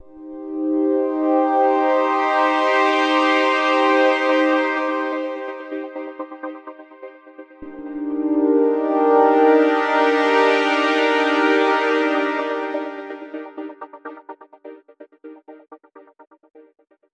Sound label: 126 two minor chords stutter verb long; SYNTH AND ELECTRONIC LOOPS